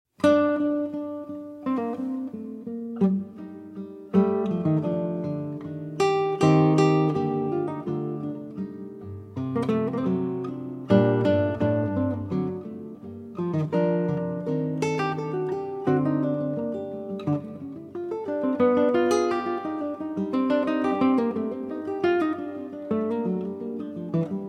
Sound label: Sonata No. 1 in G Minor, Fuga, Guitar; BWV 1001 II.; Arr. By Derek Gripper